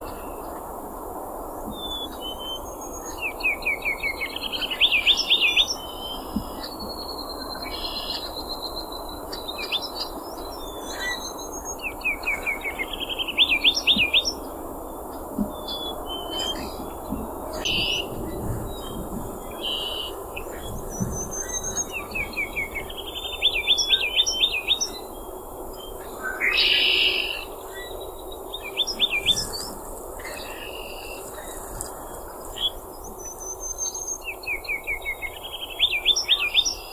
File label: Ruby-crowned warbler song